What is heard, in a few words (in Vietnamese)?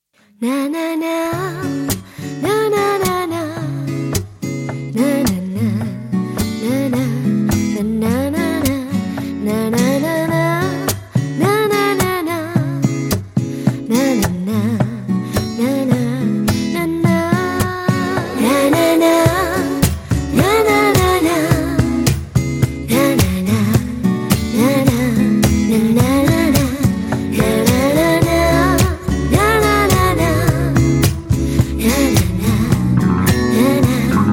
nhac nhe nhang